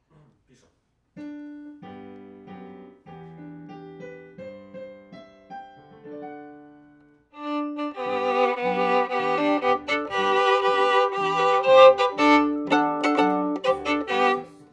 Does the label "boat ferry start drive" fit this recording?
No